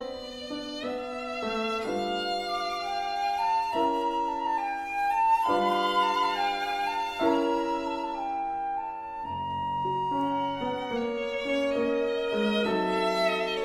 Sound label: suite op 59 for flute violin and piano ii pastorale